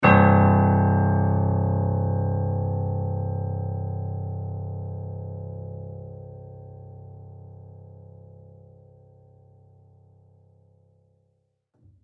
C1 played long Upright Piano; EZKeys

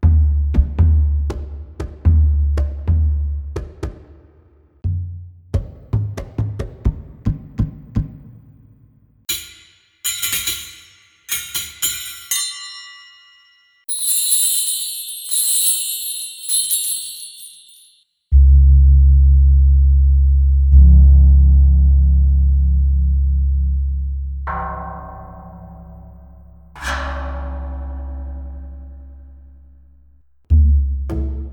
Perc Traditional

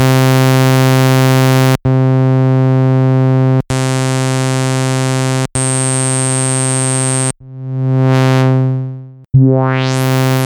Filter Low Pass

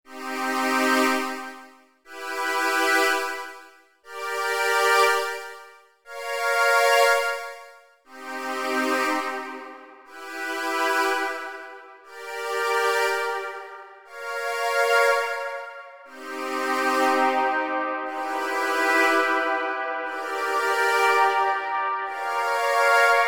REVERB Strings; CHERRY AUDIO GALACTIC REVERB

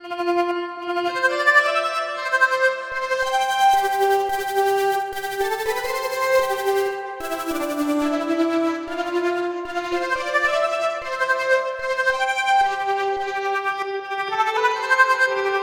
Augmented Piano Lead

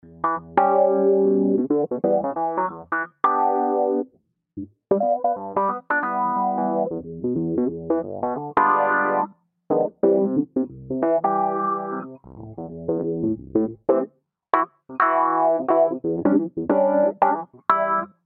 Electric MK1 Retro Funk; EZKeys

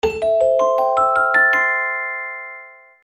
epiVerb dry

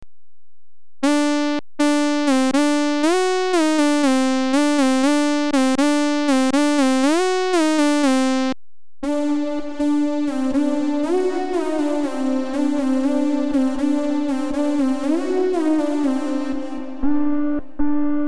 FINISHER FLUXX Lead; UJAM

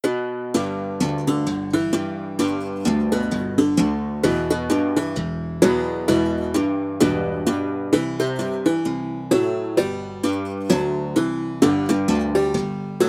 Guitar MIDI; gilgarry

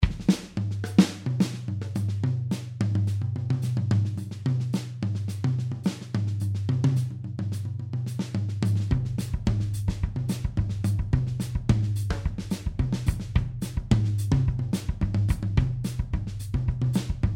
Drums Fairfax; Addictive

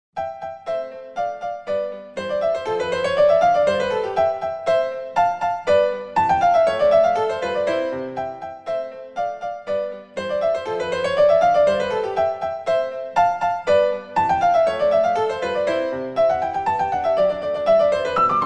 Piano; Smidy Plugin

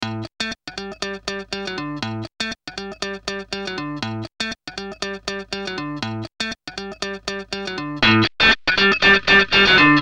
Dist Opamp 21 Guitar; ARTURIA

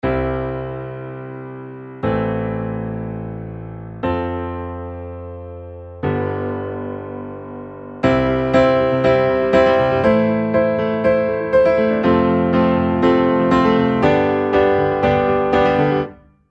Pop Rock Ballad; Toontrack EZKeys

